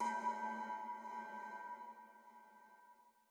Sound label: UI Sea